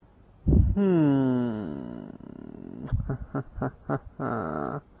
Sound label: Hmmm Hahahahaaa